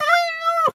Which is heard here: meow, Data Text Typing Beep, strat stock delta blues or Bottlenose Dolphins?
meow